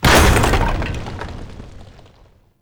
Spike Trap Fall; Objects; Ode Lantern Crate

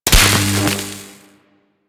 Mining Laser Fire; Weapons; Eidolon Plains; Warframe; Eidolon Mining Lasers

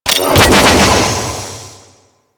Energy Pillar Insert; Warframe; Colonist Rescue

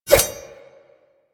Kunai Whoosh

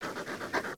sleep level up pant fast, Kubrow; Enemies; Warframe; Tame

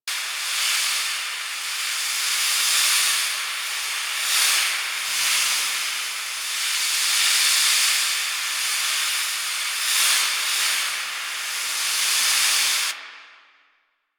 Invisibility Smoke Loop; Warframe; Quests; The New War; Drifter; Abilities

